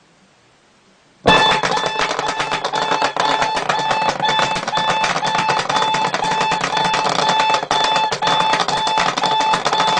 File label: Alarm Bomb Tone